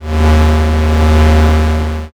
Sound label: space engine